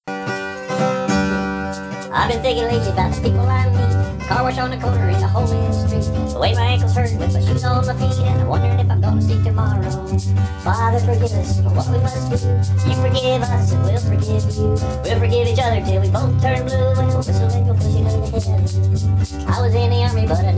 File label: Fish And Whistle